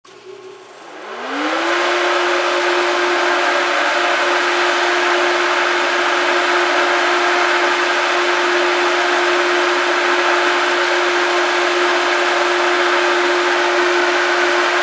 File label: Generator Startup